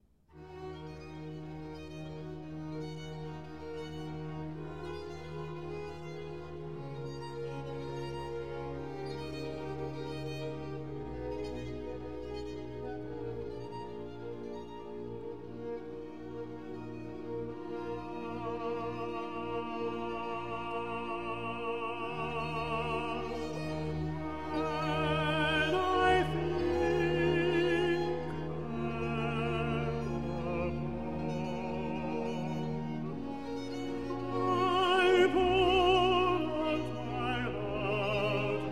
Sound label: Anthem; Chandos Anthem 6